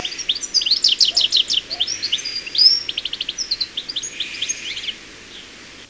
Cardellino, uccelli

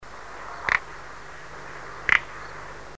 Gallina prataiola

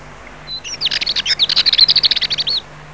Magnanina Sarda

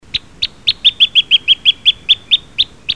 Picchio muratore di roccia

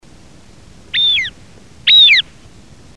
Albanella reale

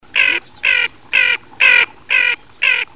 Moretta tabaccata

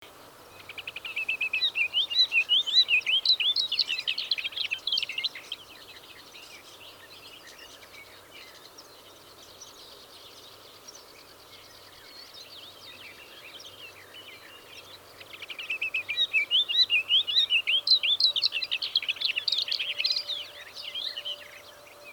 Canapino asiatico

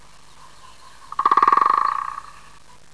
Picchio rosso di Siria

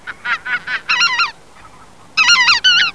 Oca lombardella minore

